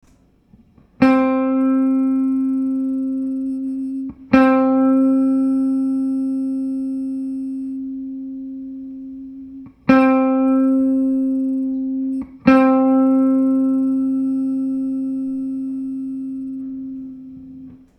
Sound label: humm